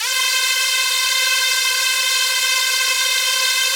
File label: SPACE STRINGS, Yamaha VP-1; Music Store